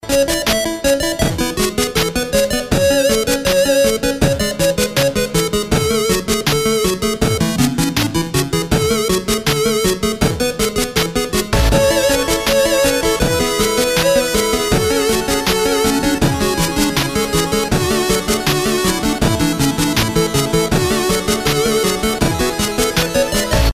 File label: Canon in 8-Bit